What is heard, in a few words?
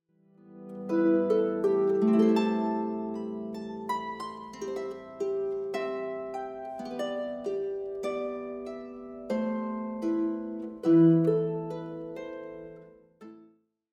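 Farewell To Music, harp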